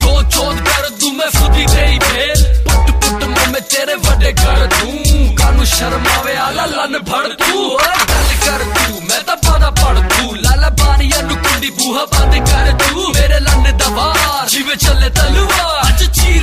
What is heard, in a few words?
Hip Hop Song